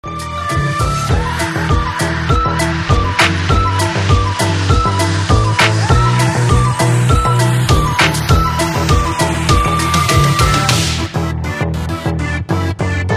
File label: wonky toy